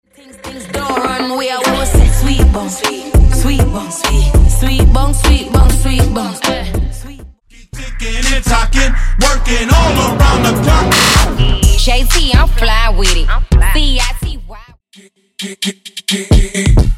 Funk Top 40 Tracks Redrum Mashup Segue Transition EDM; 100 Tracks